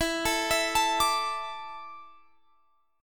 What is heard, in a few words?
D Suspended 2nd E, chord